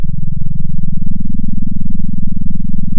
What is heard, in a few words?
center, sound of a small motorcycle